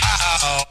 "ah ah oh"; Voice; ooh ahh

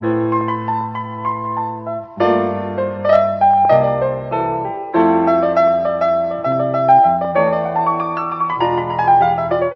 piano; Phonky

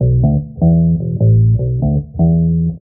bass, Loops, Phonky

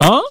"huh"

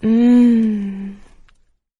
mmm, voice; ooh ahh

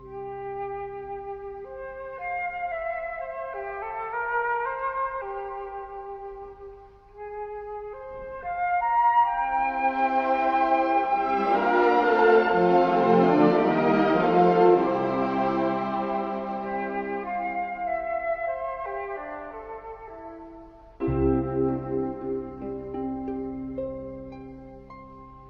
flute and strings, Loops

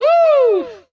woo

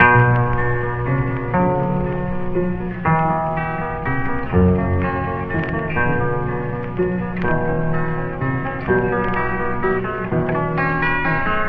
guitar, Loops; Phonky

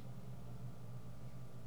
line noise, Vinyl; Misc Percussion

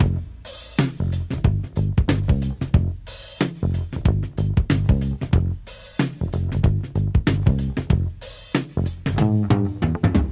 full, Loops Phonky

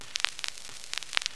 frying

